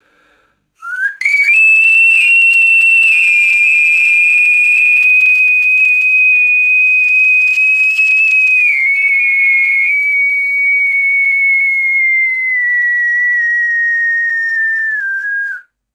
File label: shrill metal whistle bursts with descending pitch